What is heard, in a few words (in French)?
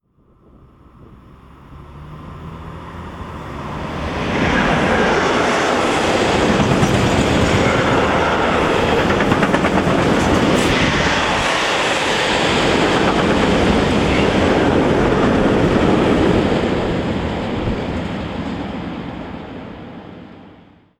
bruit joints de rails; sonotheque